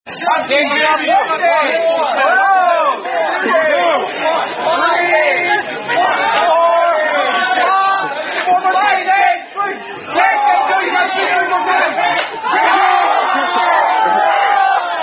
Dizzy Stick Drinking Game Drinking From Bat Swing At End